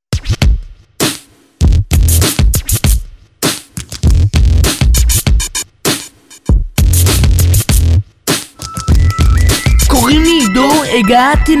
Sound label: Drasha beRap